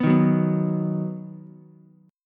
D sharp Minor Sharp 5th D sharp down Ukulele; strummed; JGuitar

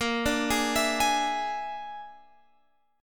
A sharp 7th Flat 5th A sharp, Guitar Open G; 20,19,18,21,21,x; down

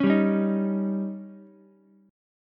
Eb 7th, Ukulele; 6,7,9,6; Eb7 strummed; JGuitar

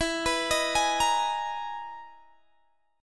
E-Major Sharp 11th E, Guitar Open G

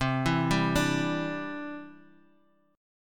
C-Major Add 9th

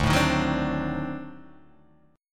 D 7th Flat 9th D down Guitar Open D

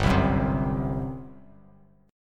G Major 7th Suspended 4th G down; GM7sus4 strummed

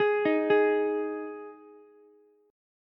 G sharp 5th G sharp, down, Ukulele; arpeggiated; JGuitar

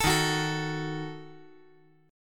Eb Diminished; Ebdim strummed; 14,15,14,12; JGuitar

